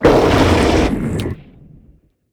SPINELING GIANT damage

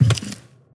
Footstep Crawler, Monsters; Footsteps, Monsters

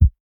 Heartbeat Diastole

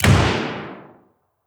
Revolver; Weapons

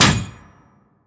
Metal Impact Heavy

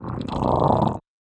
MUDRAPTOR pet Happy

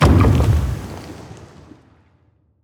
harpoon Launch; Thalamus; CARRIER

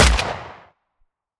assault Rifle; Security; WEAPONS

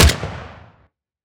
WEAPON rifle Shot; Weapons

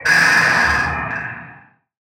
attack; Barotrauma; Legacyfractalguardian2

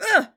female damage; Human

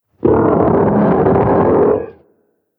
damage; Barotrauma; Bonethresher

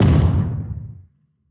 Explosion Small; Weapons

